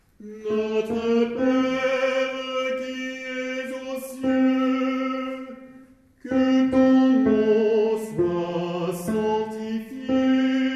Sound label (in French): Notre Père Tenors